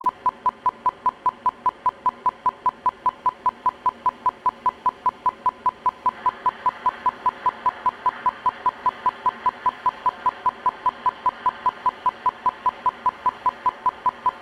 burst 32ms 1kHz; Mic2 guida; CESI; LaSpezia

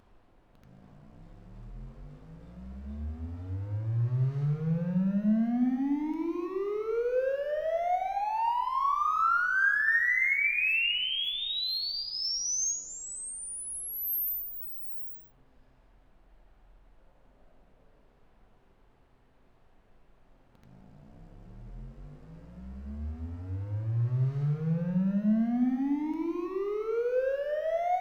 Sweep 15s recorded 1m; Bedrock-Omni

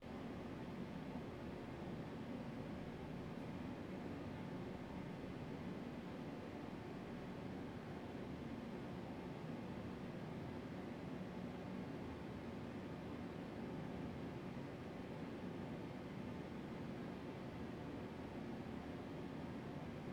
Signal Noise

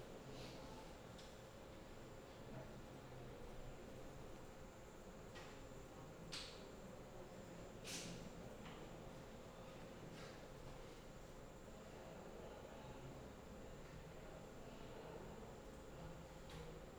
Background noise

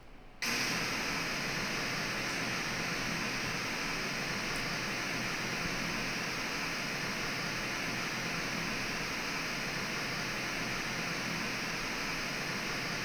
MLS Binaural Raw; Impulse-Responses

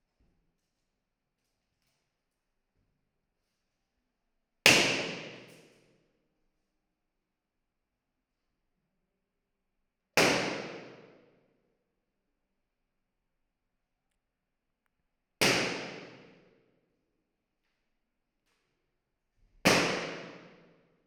Balloons Binaural; IR-STI

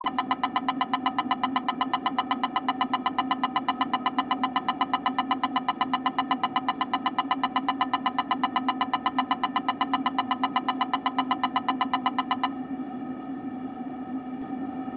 burst 16ms 1kHz; Mic1-2 tubetto; CESI; LaSpezia

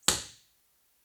IR

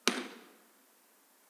IR sweep, Teatro Benevento; IR-Teatro-Benevento

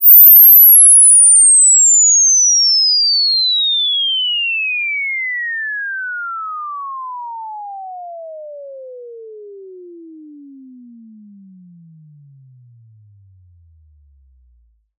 invsweep; Impulse-Responses

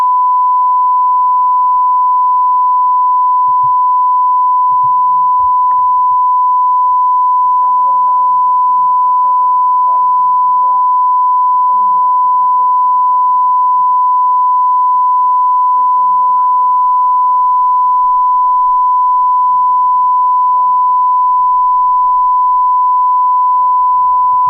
Calibration

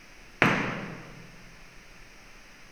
MLS Binaural IR; Impulse-Responses

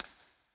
IR Scala Center

